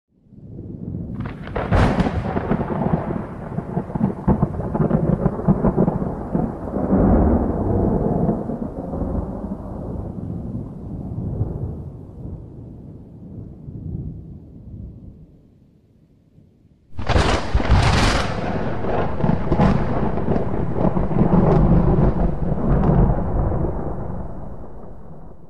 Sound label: Thunder strike close perspective